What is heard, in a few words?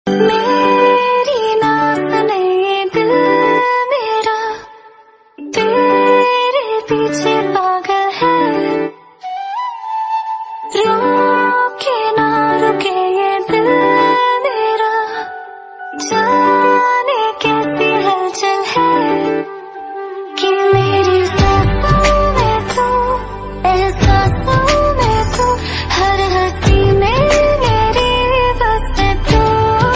Female Song Ringtone